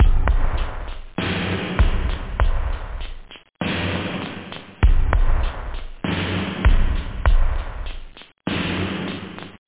etronic, loop house

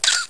Camera Click Short

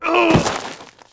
DIE; Urban Chaos

